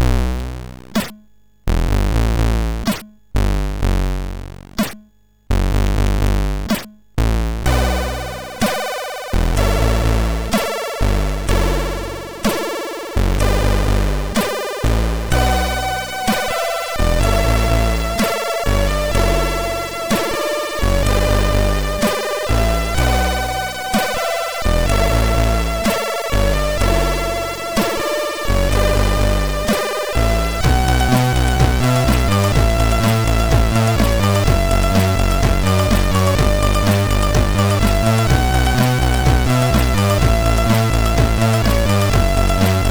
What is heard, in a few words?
Short Tune, MOS6581R3; sid